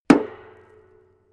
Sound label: metal impact small light; hits explosionen; metall